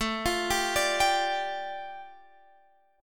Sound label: A 7th Suspended 4th Sharp 5th A, Guitar Drop D; chord